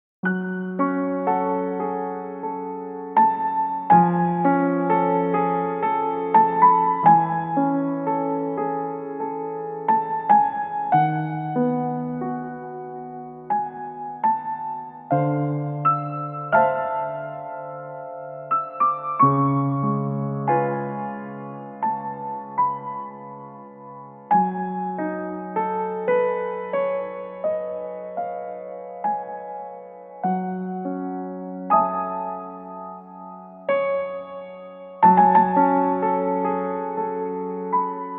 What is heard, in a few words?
piano reflection